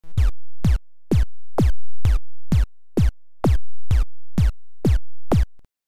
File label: baseKick